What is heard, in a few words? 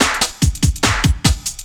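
loop snare drum, 145 BPM, drum & bass; Ueberschall; Drum & Bass Flash Trackz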